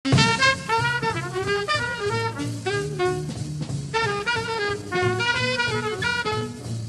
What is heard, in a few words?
melody